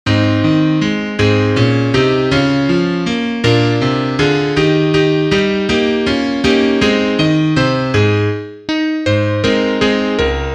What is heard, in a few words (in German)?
Klavier; my bonnie